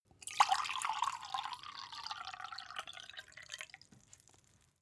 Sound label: glass pour ring; berufe; gastronomie; soundpool